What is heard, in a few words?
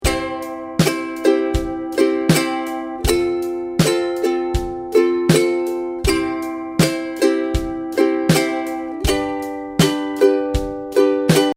strum, ukulele